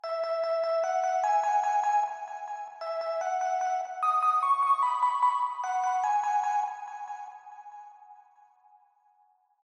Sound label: Synth Layer; Reality